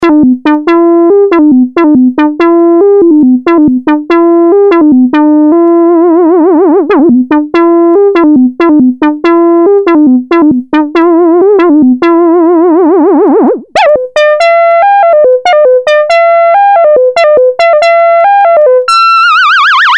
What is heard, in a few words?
lead